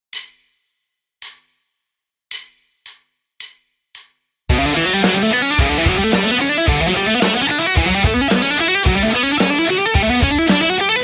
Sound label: legato fast